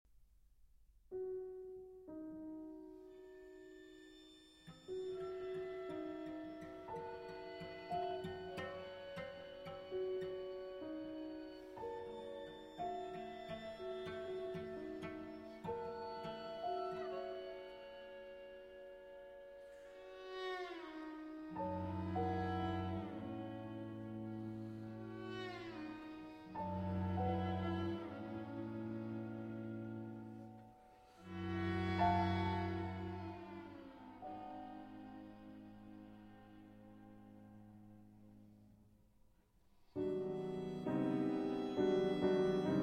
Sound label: Piano Quartet